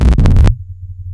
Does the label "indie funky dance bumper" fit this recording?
No